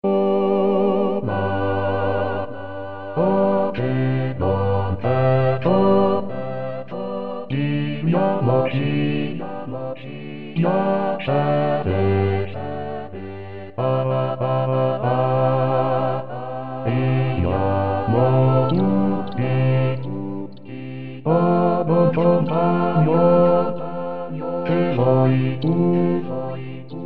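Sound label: bass; Eco de lassus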